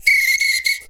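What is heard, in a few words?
foudang groove; Best Service; World Colours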